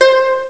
koto

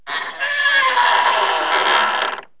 squeaky; Redneck; PC World June 1997

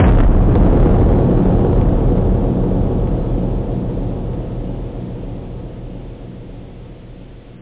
jungle efect, boomdrum; jungle ii

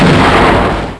boom; After Dark; Rockets 1.2

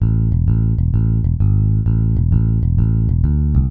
BASS, 130 bpm

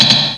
Score, Pinball Demo Music; 3D Ultra Pinball Demo

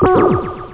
Sp Laser

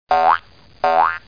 BOINK; MPG-Net (USA)

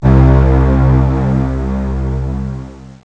strings

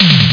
cool drum

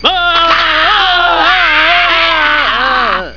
PAIN

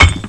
Object Hit; Ferazel's Wand Demo

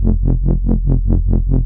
low throb; 11,025 sample rate

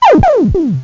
Death Beeps; Revenge; deathfx

